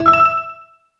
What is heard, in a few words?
beep